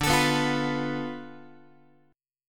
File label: D-Minor 6th, D, Guitar, 4A, 4E, 5A, 5D, 5G sharp, 6C sharp; Dm6 strummed; JGuitar